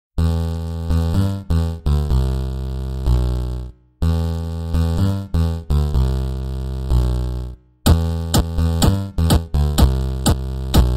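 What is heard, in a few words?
8-bit music for mobile phone ringtones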